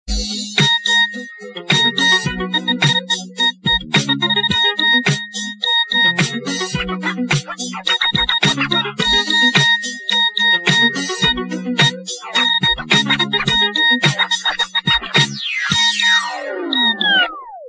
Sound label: mono nokia, ringtones, electronic